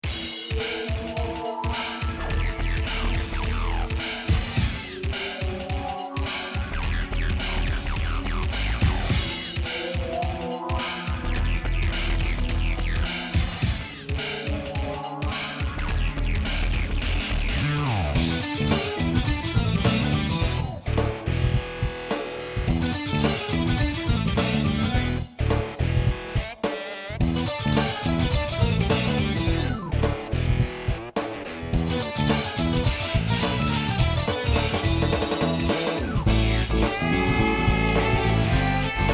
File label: rock; YAM QY100